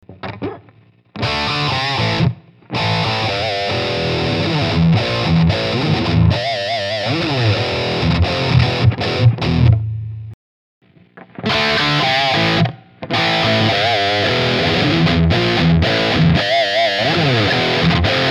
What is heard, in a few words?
podxt 5150